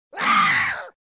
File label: Boss Attack